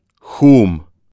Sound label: hum